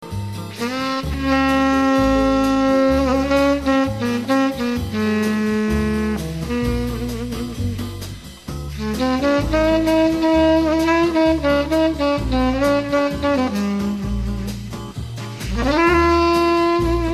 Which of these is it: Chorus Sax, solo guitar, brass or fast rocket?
Chorus Sax